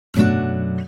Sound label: Guitar open; Nokia; 8600 Luna